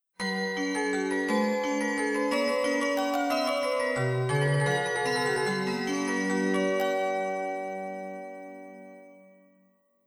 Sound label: tohoku chime